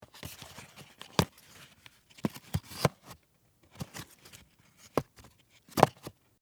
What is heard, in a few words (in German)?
Kueche Ei Packung